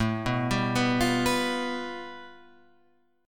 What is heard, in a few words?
A 7th Suspended 2nd Sharp 5th, Guitar, Open F; A7sus2#5 arpeggiated; JGuitar